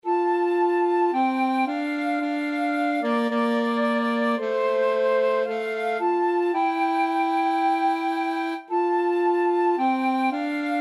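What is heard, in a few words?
brass flute alto sax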